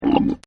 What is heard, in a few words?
Camel Voice; Camels; Partners In Rhyme